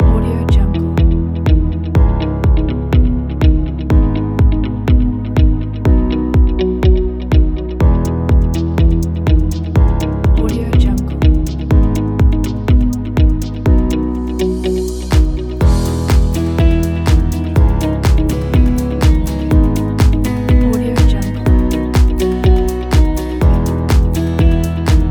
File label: emotional inspiring